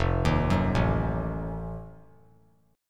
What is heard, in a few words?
F 7th Flat 9th F down; F7b9 arpeggiated